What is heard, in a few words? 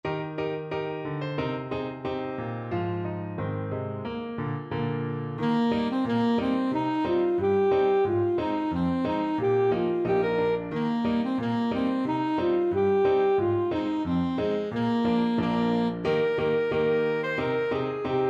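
alto saxophone; mairis wedding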